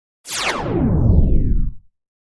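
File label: Laser Double Laser Fire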